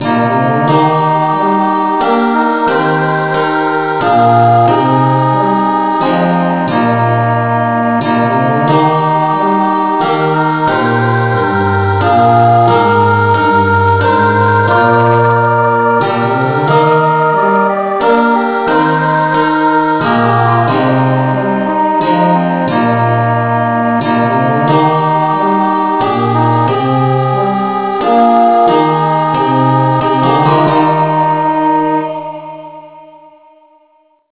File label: no vocal, key e